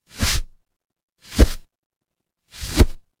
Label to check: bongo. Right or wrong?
Wrong